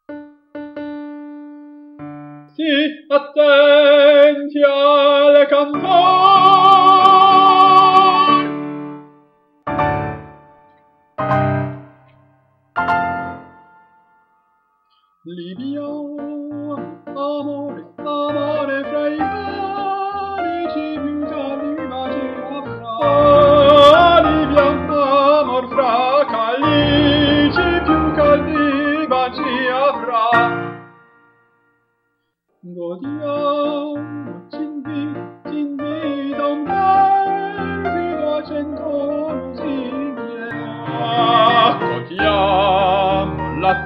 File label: tenors; libiamo